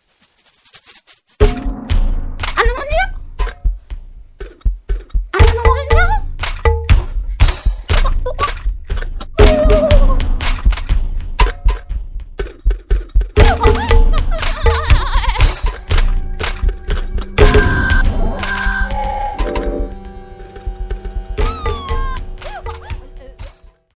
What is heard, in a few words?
electro